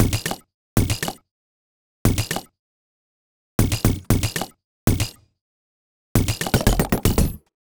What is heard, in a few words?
Robot Thunder, Modular Electronica